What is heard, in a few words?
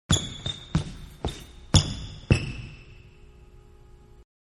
Basketball Sneaker squeaking, Several; Basketball Indoors; Basketball Indoors-Sneaker Squeaking Several